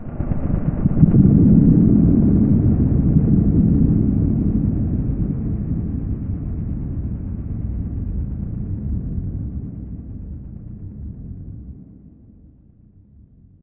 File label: citadel cloud hit; ambient; city; source engine; episodic